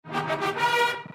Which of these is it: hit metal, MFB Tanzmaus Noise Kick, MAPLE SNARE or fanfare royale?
fanfare royale